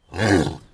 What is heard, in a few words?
Horse; anemoi